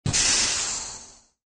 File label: Pneumatic door